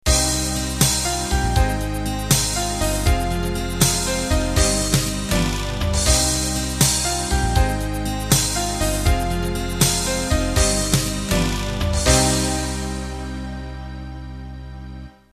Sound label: 12-8 Ballad End